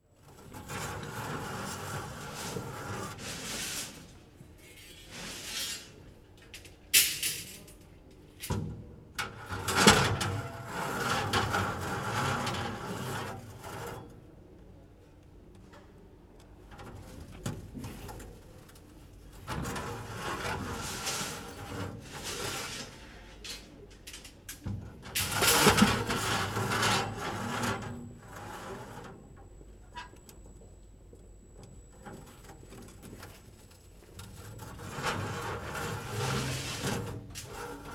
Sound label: hardware loading container